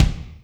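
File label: kick Tiled Room, Alesis D4 drum machine; 300 Drum Machines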